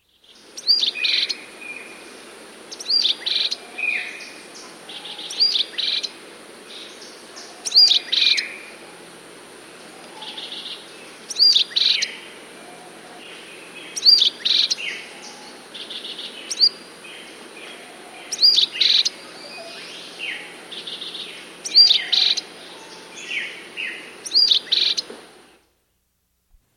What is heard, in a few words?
Olive-Backed Euphonia, S. America